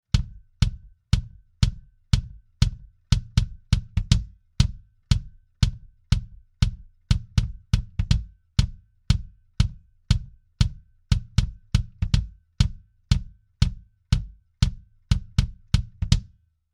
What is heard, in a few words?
Trident A Range BD EQ